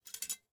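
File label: arrow rattle; smite; medusa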